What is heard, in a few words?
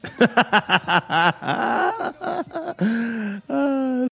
awesome laugh